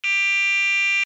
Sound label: alarm tone, sounder alarm tone; sounder beacon